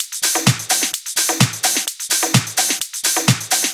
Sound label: House loops 128BPM; Vengeance Samples